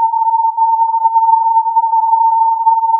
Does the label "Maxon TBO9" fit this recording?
No